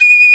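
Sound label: vibrato flute G4; AKAI S-Series CD-ROM Sound Library VOL-8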